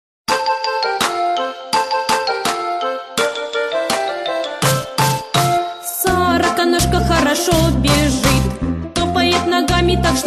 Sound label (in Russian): Музыкальная игра